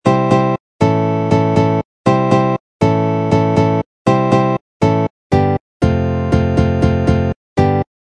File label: intro, barre chord